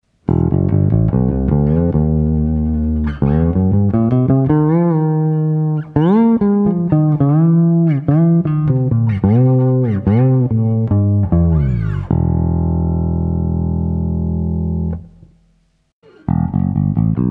ashtone fretless sounds

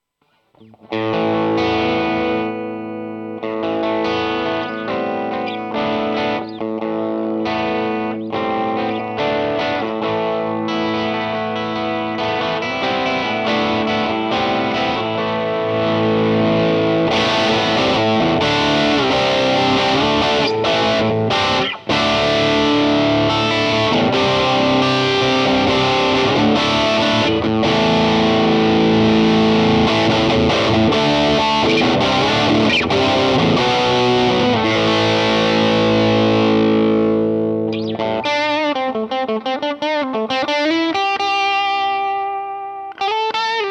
nux amp force gain

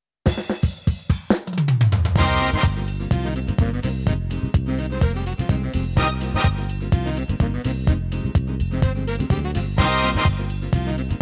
ctk-6200

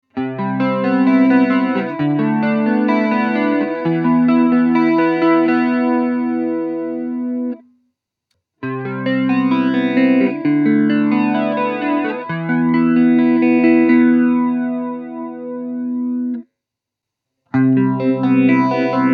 mod fx

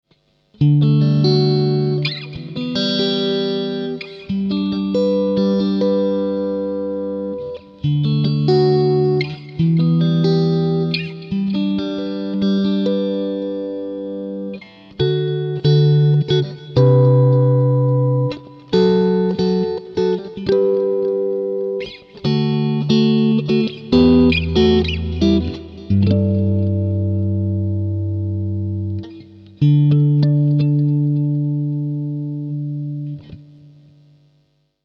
flutes

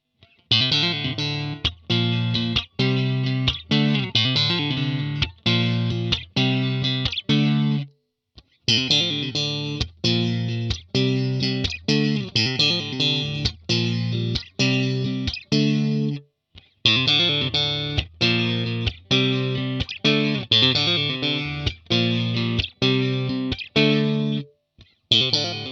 swing standart plus w seymour ssl1 tb4

